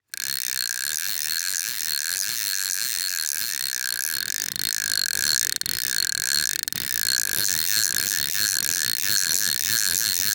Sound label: Reel Fishing Pole Long; Sports